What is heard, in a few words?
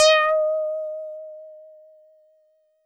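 Mono Bass DX100 D#6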